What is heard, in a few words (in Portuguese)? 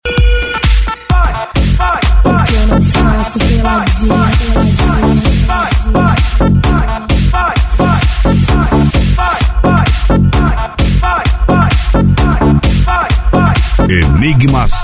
especial eletro funk